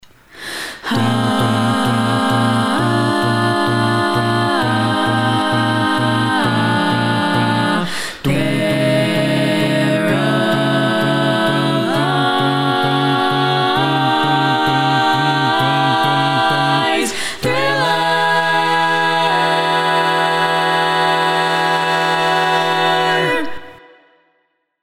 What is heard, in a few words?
All Parts, barbershop